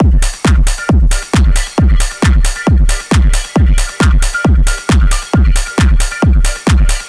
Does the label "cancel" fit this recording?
No